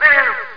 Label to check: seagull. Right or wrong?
Right